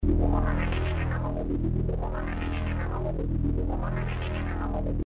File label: power core; Blackeye